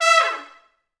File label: Pop Brass, E4; Best Service; ProSamples vol.19; AKAI